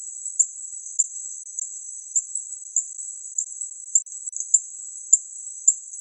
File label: Stumpffia contumelia